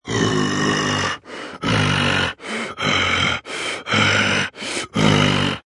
Growl Multi Heavy, Threatening monster, animal, fantasy creature, breathing; breathing heavily and snarling, sneering, growling